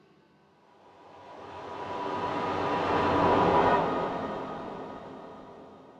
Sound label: echoes; thief 2014 gamerip